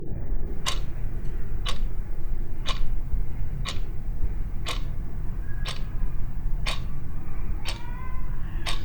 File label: clock tick tock; M8; percussion and foley